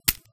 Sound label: bonebreak; Barotrauma; Neurotrauma